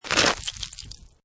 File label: Snow And Ice Hard Snow Or Ice Scrape Debris; Weather